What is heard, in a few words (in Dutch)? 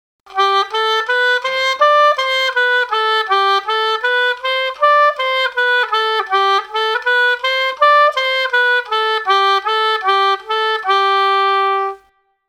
blaasinstrumenten hobo